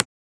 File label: Transient; FLPRO